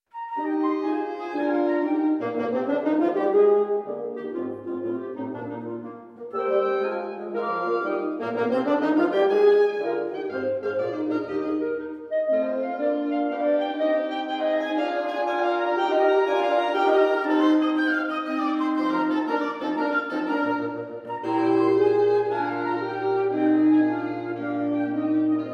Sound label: Quintet; in Eb Major, op 88, no.2; Mvt 4